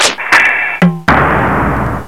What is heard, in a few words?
noise; Rubber Chicken Software Sample Bank; OLD SYNTHS; OTHER OLD